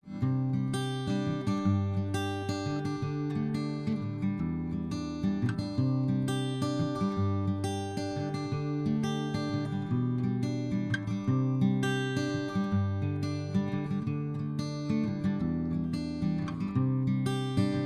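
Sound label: TLM103 picked guitar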